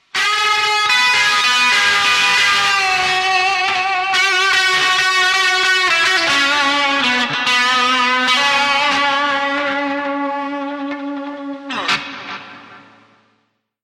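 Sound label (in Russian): блюзовый гитарный мотив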